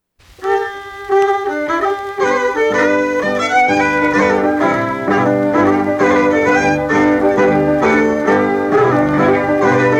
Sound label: Whistle; Whistle Or Lave Ot